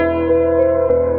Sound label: piano, Sad